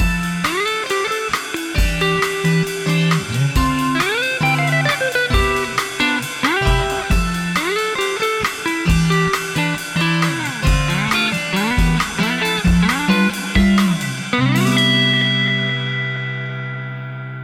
Coffee Shop Commercial

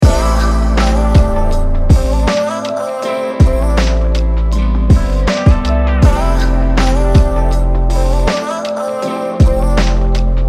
piano luv hop

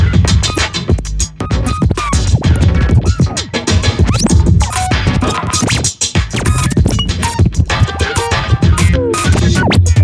bpm100 Collage Loop; 8bar music

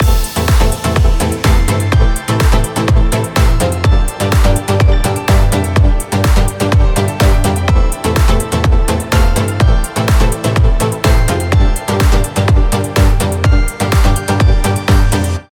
Electronic Forest

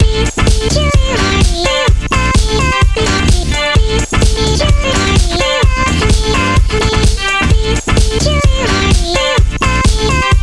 lovefunk; iaeing

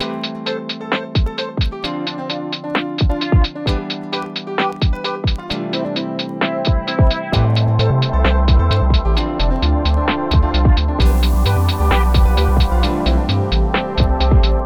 Improvisation

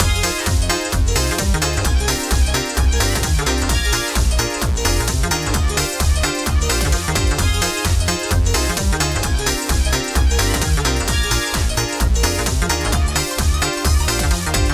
beatdswing

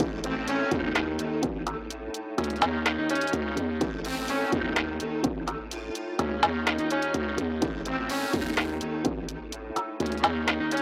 bounce trap

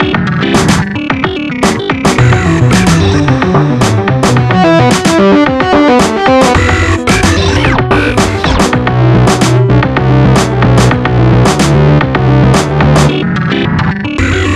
Distorted

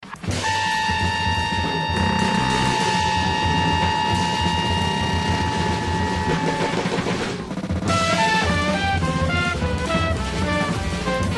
dead jazz

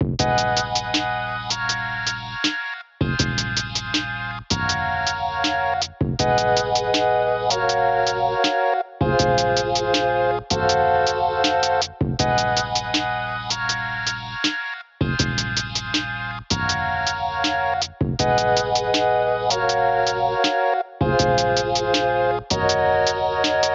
Chill Wave

